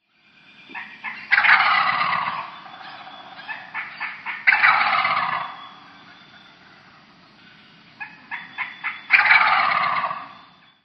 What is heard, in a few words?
Wild Turkey, Birds